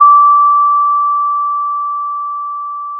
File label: Sound of a school bell